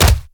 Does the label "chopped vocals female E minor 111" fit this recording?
No